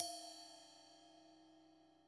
Trash, drum

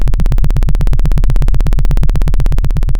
pop, Sound of a helicopter

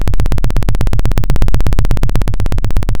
Sound of a helicopter

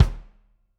room Kick, drum

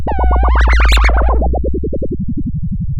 Sound of waves crashing on a shore

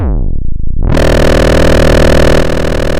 Sound of a chainsaw

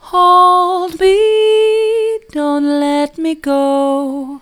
hold me dont let me go soft, vocal

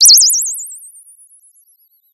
Sound of a bird tweeting nicely

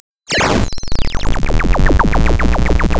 pop

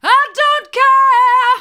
i don't care hard, vocal

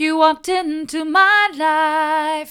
mid, vocal

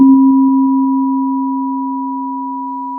Sound of a church bell

